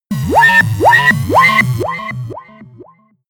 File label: shot techno wobble